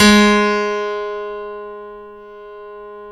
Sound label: StereoGrand, Keyboards; E-MU Producer Series Vol. 2 – More Studio Essentials